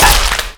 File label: melee skull break, violence; Benny Placeholders